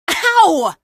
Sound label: physimpact loud; episodic; episode 1; Source engine